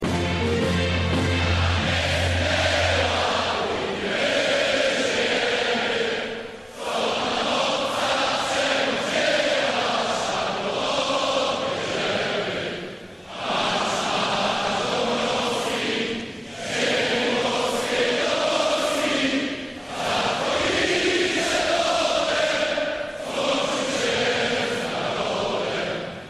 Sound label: Hymn Polski